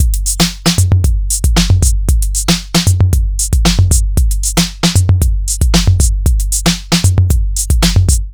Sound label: 115, Digital Breaks; Loopmasters; Jon Carter & Alex Blanco; Block Party Essentials